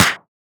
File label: hand clap sample f sharp key; single hits one shots